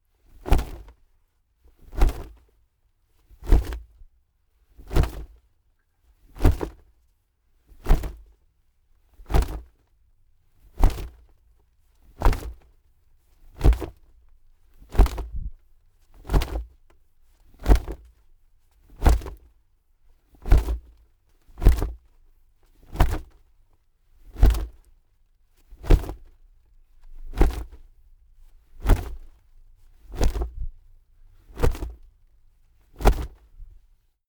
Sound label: wings flap; natur-tiere; voegel